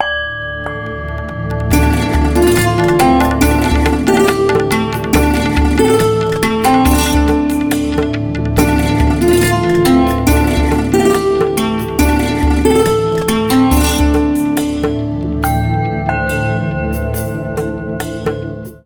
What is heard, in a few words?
Santoor Ring, Ringtones; Samsung Z3